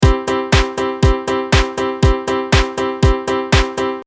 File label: piano short